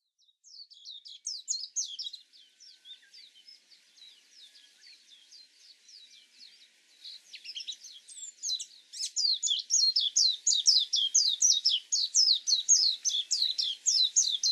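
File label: warbling white-eye, hahajima; mejiro; koe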